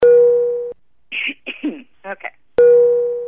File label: cough